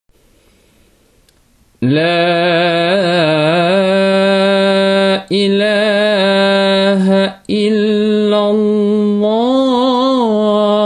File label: Adzan